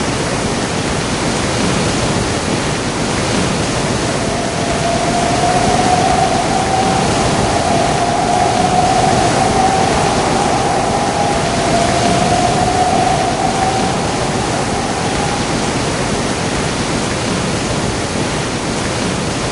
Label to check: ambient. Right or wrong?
Right